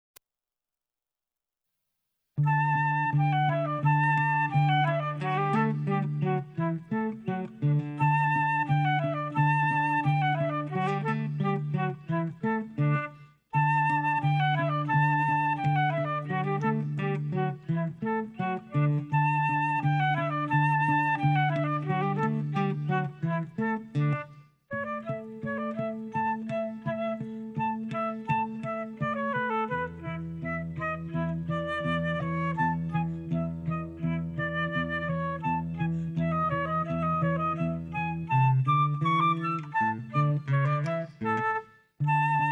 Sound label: adagio musette